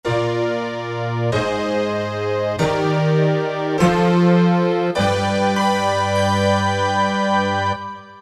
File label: Pianish Horns; Roland PN-D50-03